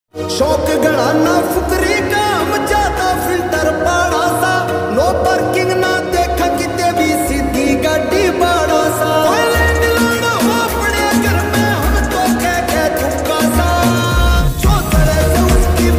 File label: Ringtone